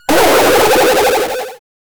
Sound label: retro pinball bumpers, arcade